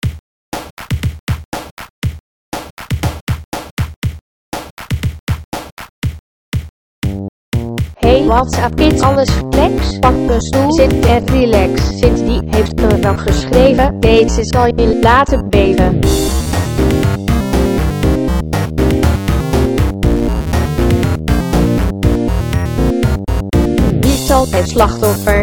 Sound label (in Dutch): Epic rap